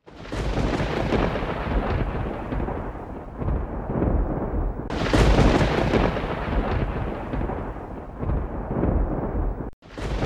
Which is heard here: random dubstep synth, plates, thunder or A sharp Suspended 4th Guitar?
thunder